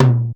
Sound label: TR 505 Synth Tom B Key, Royality free tr 505 synth tom sample tuned to the B note